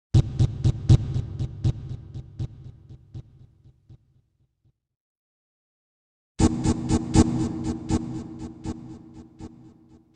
Music stinger - Synthesizer pad chord w multiple delay echoes; Hollywood Edge Sound Designers Tool Kit